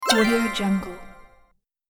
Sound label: fairy jump sparkle